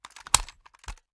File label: weap selector on, weapons; gmod; viper